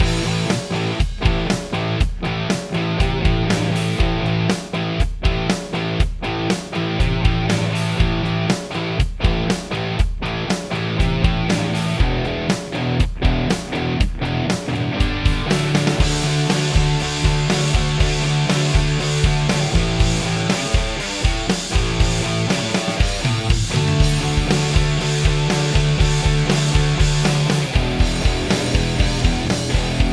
music radio station rock loop

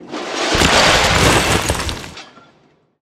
weapons explosion debris large

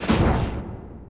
Dash

